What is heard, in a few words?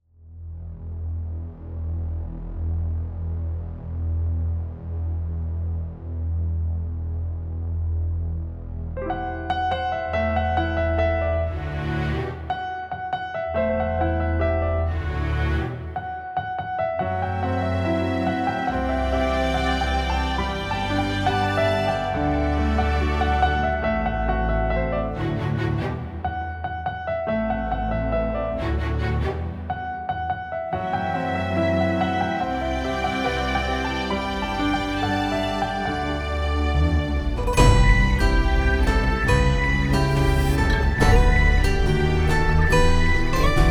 A Broken Heart Background Score